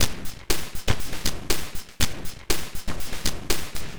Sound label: Noise Beat; Drums & Drones